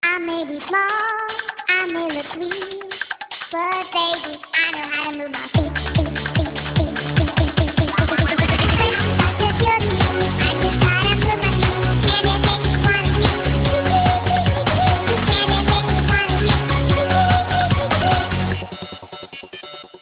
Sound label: remix